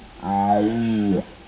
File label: C elaphus hippelaphus